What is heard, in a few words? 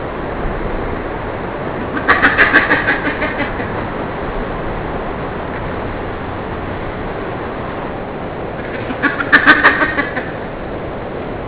scream